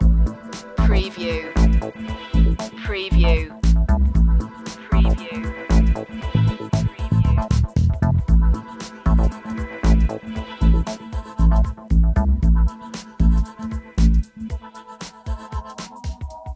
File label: packing system loop